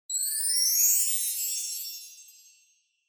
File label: Wind Chimes Short